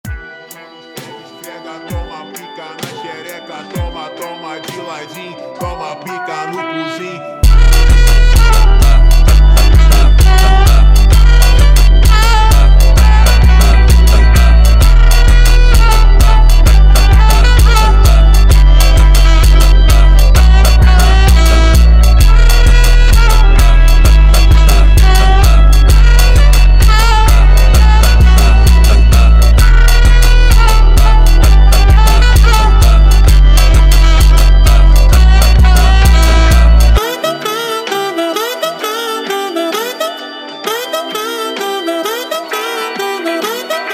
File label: Jazz Funk